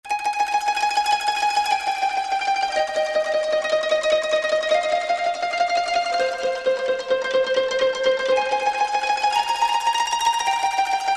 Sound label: Koto Trem, Korg M1; Factory preset examples